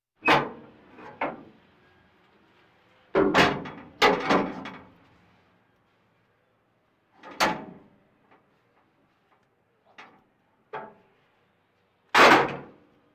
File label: Military vehicles